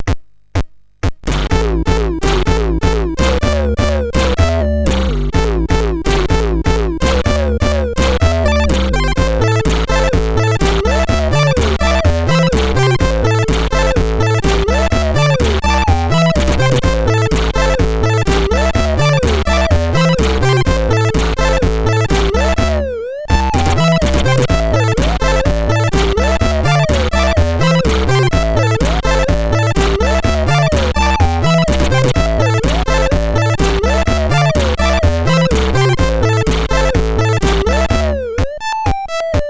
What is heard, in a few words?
Rocktro, MOS6581R4